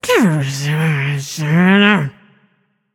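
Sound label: grumble, female voice; smite; voicepack; hera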